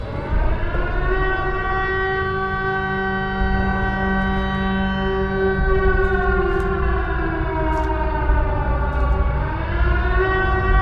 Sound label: sirena; ringtones; silent hill